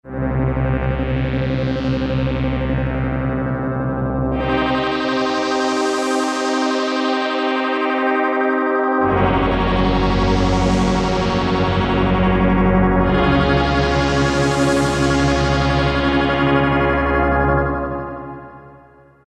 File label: TRILL PAD; Akai SG01v